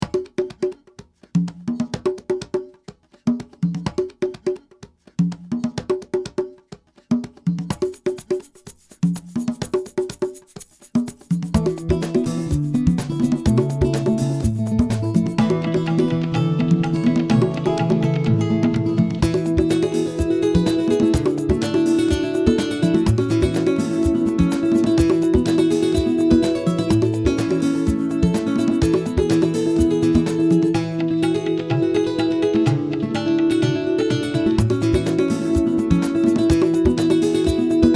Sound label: Congas Sea